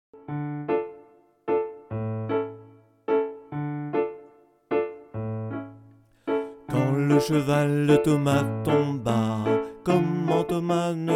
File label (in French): canon piano voix accompagnement